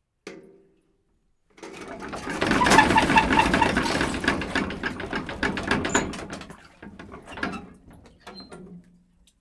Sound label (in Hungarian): saját súlyától lefutó vödör, kerekes kút; varboc